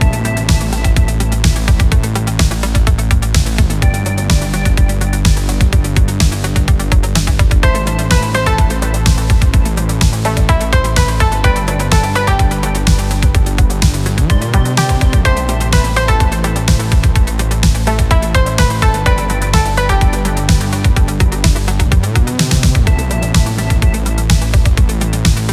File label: Instrumental